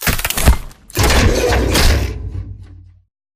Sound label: stand reload; Titanfall 2